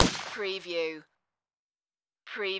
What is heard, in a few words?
Shot Gun Remington 870 12 Gauge Bird Shot Single Shot Exterior At 45ft